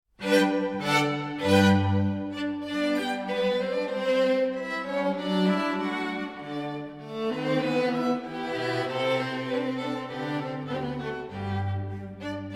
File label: room violin loop